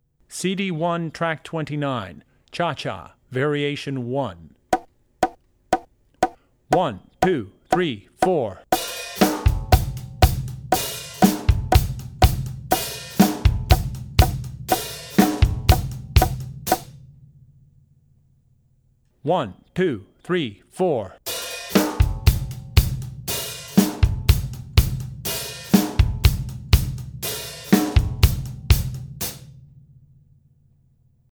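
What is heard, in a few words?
Cha Cha (Variation 2), Drum Tracks; CD1 Track29 Cha Cha (Variation 1); Drummer's Bible Tracks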